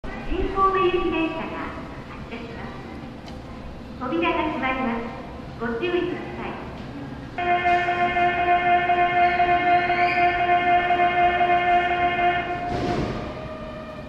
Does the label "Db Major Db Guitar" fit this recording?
No